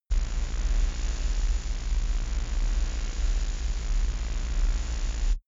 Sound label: space alien engine working medium